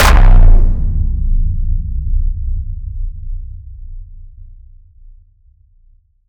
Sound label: impact strict; Virtual Riot